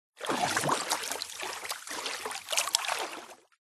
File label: nature water med shallow movement slosh